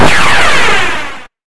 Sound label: laser